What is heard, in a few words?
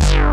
WIGGLY BS; Pro-Rec - Synthsonic; TRINITY